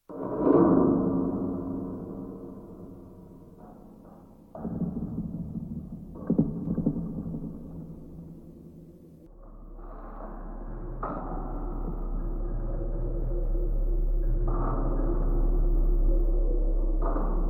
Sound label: desktop login